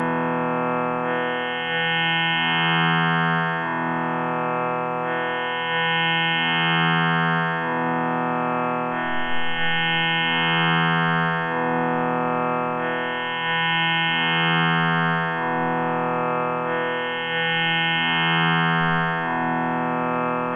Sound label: E with hard pluck middle sustain